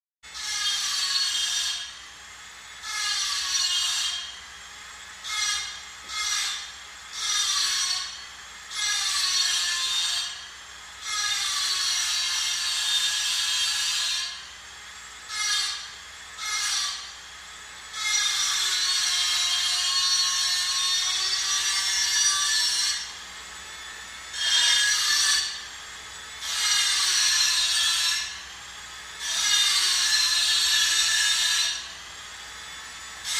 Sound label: Electric Saw; Cuts Through Metal. Medium Perspective.